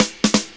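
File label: snare, drums; mercure drums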